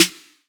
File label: Snare